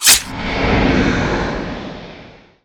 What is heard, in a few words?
dagger impact; spectre